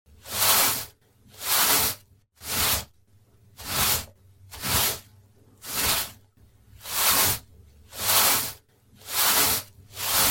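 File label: broom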